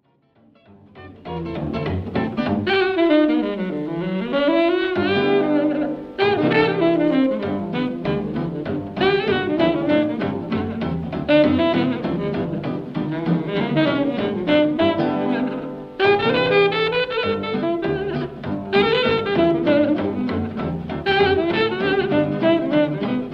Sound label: lbg solo